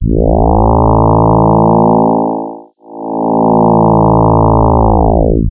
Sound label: Retro Sci Fi Door Wah Aow